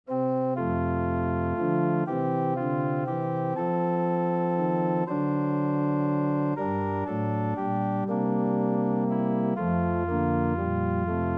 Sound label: organ; Maria, ó Mãe adorável